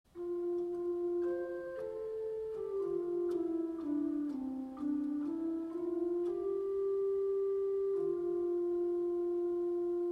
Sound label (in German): Flautraverse 8